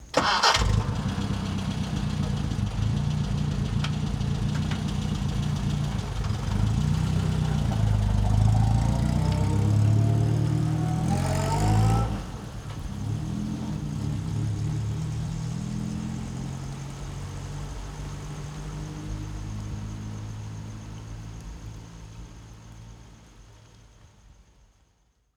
Auto Fiat Car Indian Exterior Start Idle Pull away at medium speed; The AudioVille